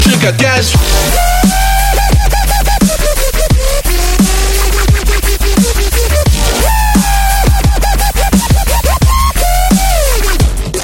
Dub

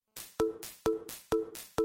message signals

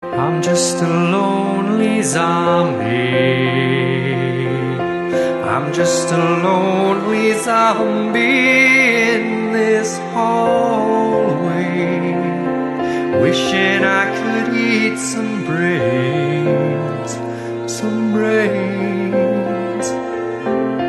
Zombie song

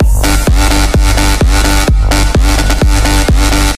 Techno Beat

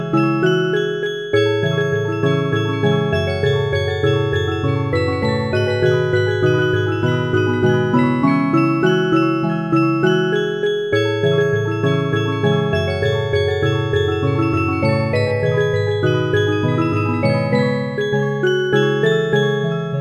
Musicbox

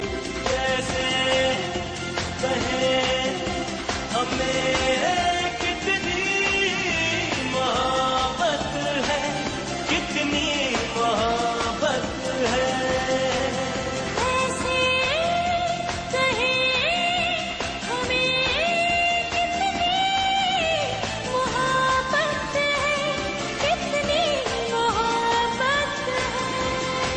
Concert Song

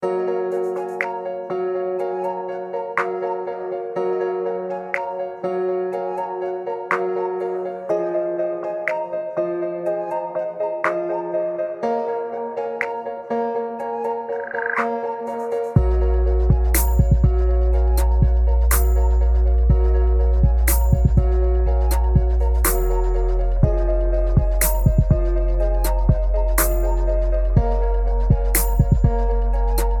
Melo Trap

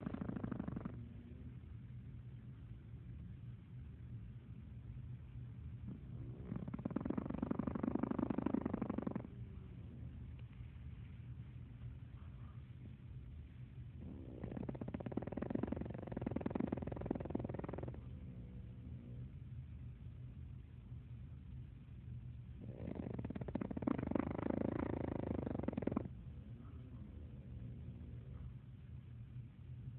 Snore

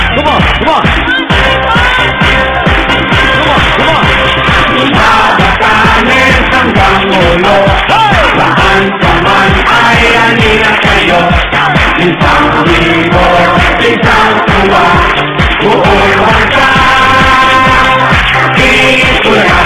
Baby

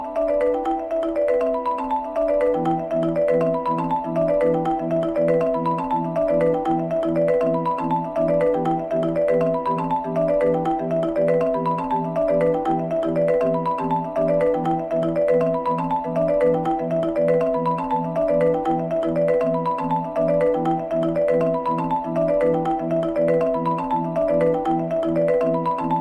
xylophones

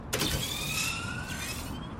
Refill; Nitrous

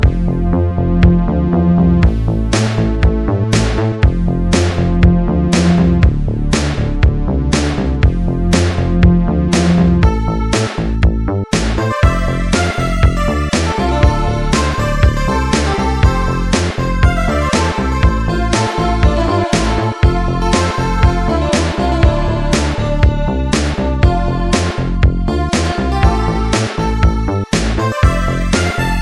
Intro Music

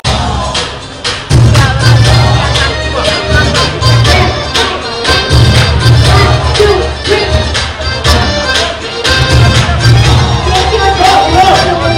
Banjara Tone

